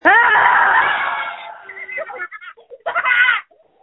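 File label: screams that feature laughter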